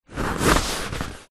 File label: chair leather body movement; office